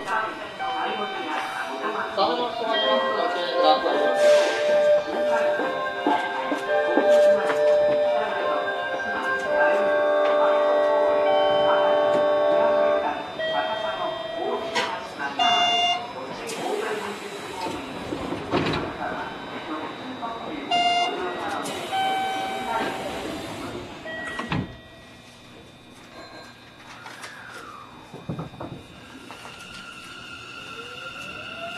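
variable-voltage variable-frequency inverter, Mitsubishi; hansin 1501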